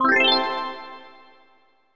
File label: SFX Patch; Banjo Kazooie